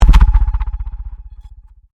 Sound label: Convolution Pulse; Sound elements to create more complex sound effects; Swell Sound Effects; Sound Design